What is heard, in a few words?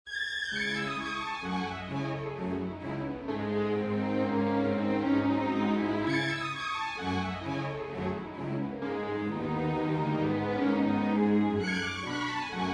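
prélude, string quartet; chopin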